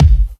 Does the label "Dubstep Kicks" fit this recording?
Yes